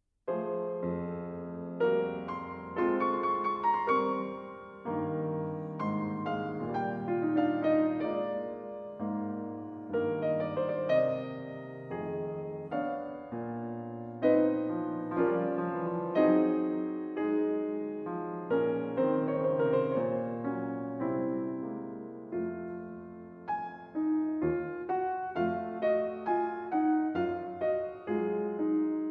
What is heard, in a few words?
Fantasia in E-Flat Major For Piano